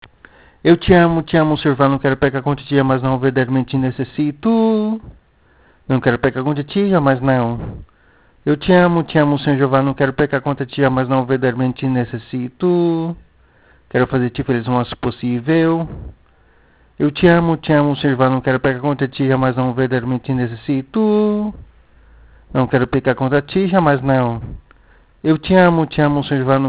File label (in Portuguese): em so voz